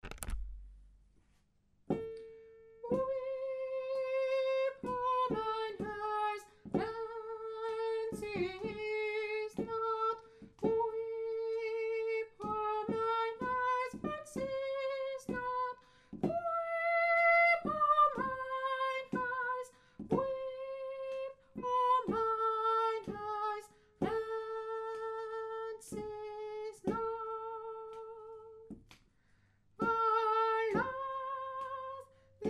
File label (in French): tenor